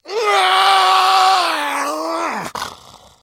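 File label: Monster Creature Growl, foley monster vocals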